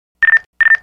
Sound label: Chirp Chirp